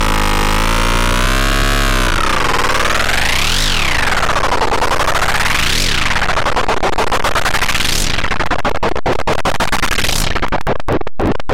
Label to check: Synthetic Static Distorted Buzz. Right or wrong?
Right